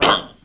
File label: piglette collision